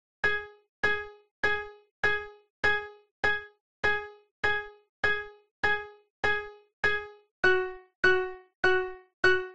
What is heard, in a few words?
piano loop